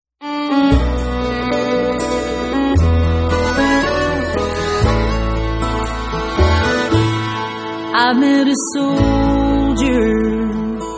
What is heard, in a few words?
bluegrass